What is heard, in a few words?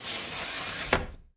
Aircrafts Mi-8MTV2 Mi8 Blister Open; DCS